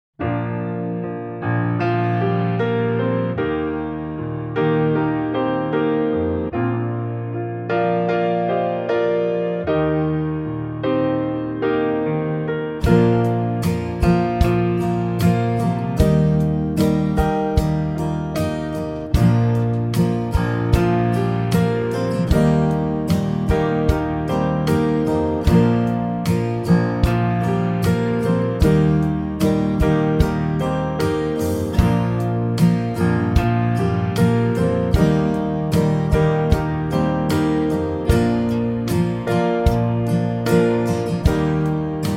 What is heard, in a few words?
demo chords